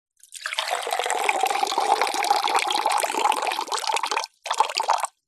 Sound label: drink wine or water pour into 125 wine glass